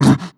breath short, zombie

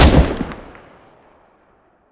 scar20, weapons; Counter Strike Model Pack; CS2

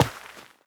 step light, footsteps, player, carpet

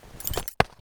knife push look at end; weapons; Counter Strike Model Pack; CS:GO

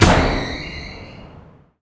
forklift start; ambient; Half Life 2; Episode 2; outland

